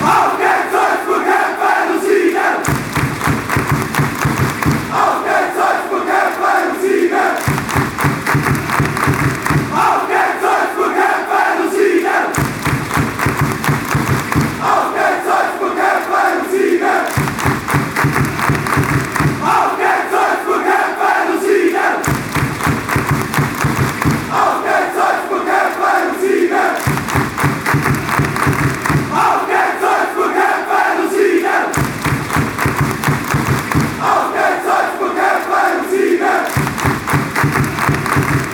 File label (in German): auf gehts salzburg kampfen und siegen